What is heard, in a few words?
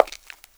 pavement, footsteps; 1000fx